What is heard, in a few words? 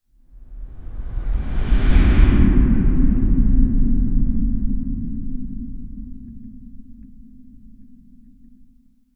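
wooden, projectile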